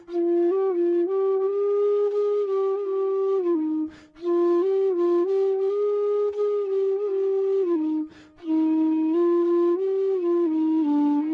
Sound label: bansuri